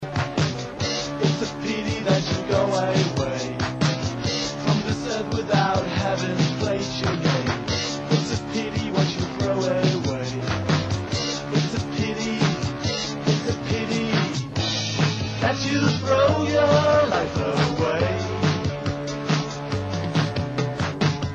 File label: Shuffling